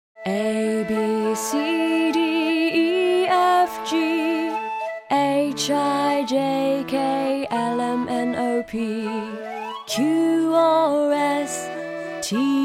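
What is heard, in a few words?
British Songs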